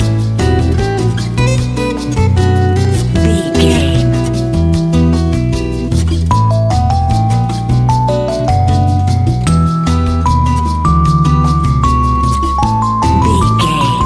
acoustic latin jazz band, A jazzy acoustic latin salsa style ballad. An exotic and colorful piece of Espanic and Latin music.